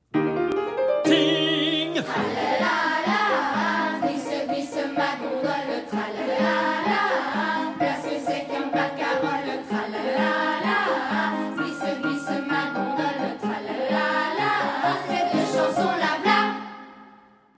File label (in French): Glisse ma gondole